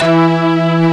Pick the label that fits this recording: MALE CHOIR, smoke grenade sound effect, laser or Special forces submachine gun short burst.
MALE CHOIR